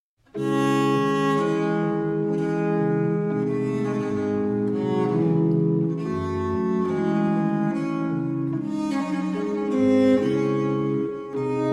Sarabande Partie a Viola da Gamba solo com Cembalo D Minor; Theodor Schwartzkopff; German Viola da Gamba Sonatas